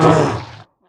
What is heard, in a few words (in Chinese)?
北极熊受伤声